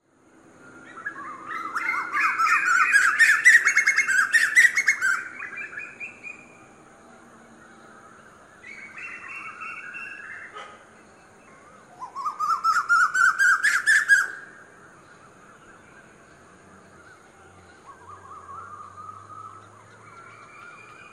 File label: Asian Koel male song